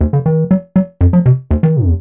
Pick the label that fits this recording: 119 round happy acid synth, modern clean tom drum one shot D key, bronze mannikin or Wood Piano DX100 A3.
119 round happy acid synth